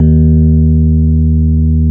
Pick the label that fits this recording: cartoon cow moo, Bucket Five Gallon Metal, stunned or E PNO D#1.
E PNO D#1